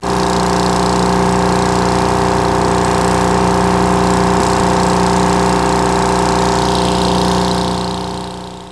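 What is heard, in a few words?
generator; plaza2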